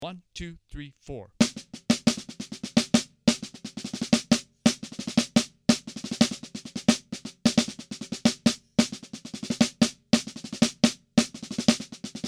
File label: Melody Snare